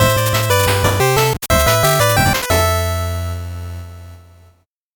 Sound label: 8bit jingle normal